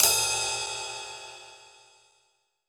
Pop Ride Phrase, Cymbals; Roland BK-5

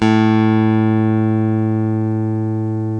ELECTRC BASS; OMI - Universe of Sounds

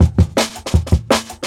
Jungle Breaks

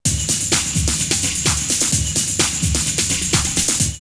drumloops Techno House 128; In-Tense; X-Static Goldmine

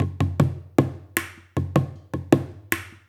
HAIR DRUM; Spectrasonics; Liquid Grooves 1 & 2; AF.PLANET

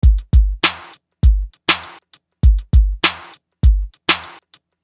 Beat, Hip-Hop Street Beats

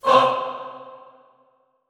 FAH C3, Classical Choir; Best Service; Extended Classical Choir

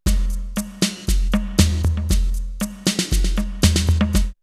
R8 Bossa, drumloops, DrumMachines; X-Static Goldmine

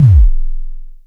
KICK LO, Dual Percussion Synthesiser; Klone

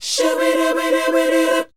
SHOO B D, R&B Dance, vocal; Spectrasonics Vocal Planet

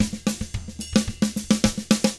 SMBA, SAMBA; ILIO; Double Platinum Drums 1

